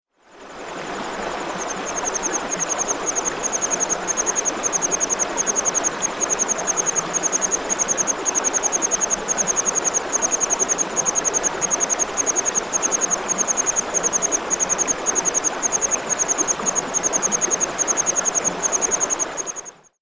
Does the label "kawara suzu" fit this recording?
Yes